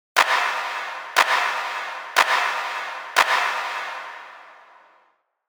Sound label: Reverb with clap.; noise gate